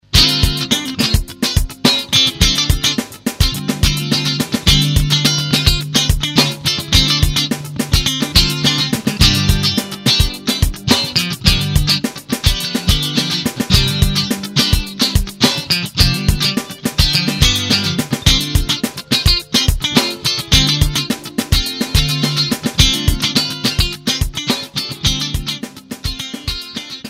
crate gt65 clean, guitar

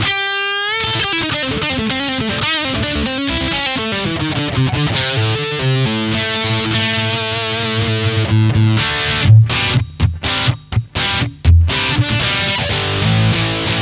bridge p90, guitar

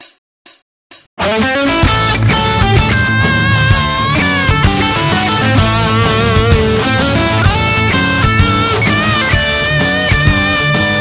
blues cool lazy

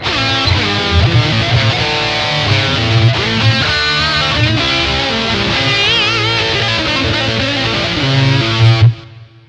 57 classic dist bridge, guitar

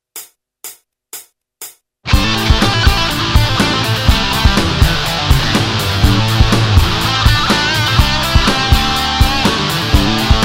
lick, guitar

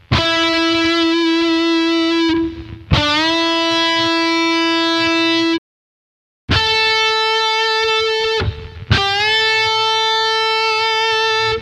bending, guitar

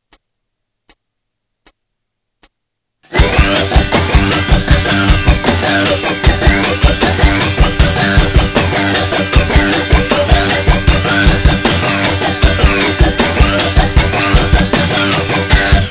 e scratch, guitar

